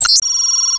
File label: window; XDM